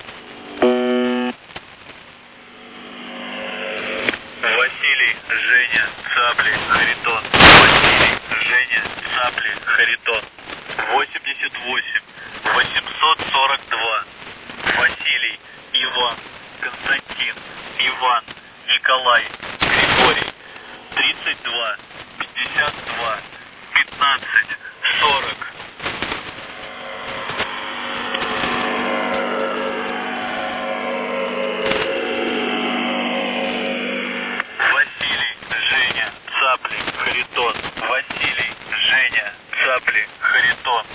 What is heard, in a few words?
buzzer usb msg